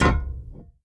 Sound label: mech, player steps; quake2